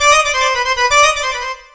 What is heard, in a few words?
SYNTH, ARABIC